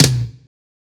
Tom Flam B; samples

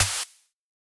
Clap Snare

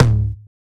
Tom A; samples

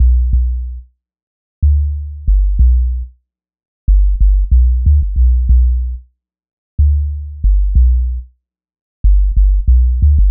Bpm 93 A Low Hiphop Dub Bass

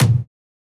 Tom A#; samples

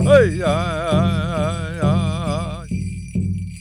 Navajo drum vox shaker

